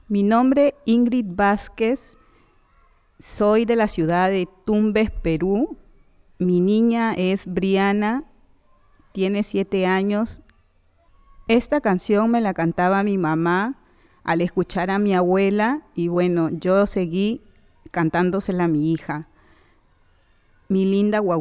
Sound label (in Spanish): canciones de cuna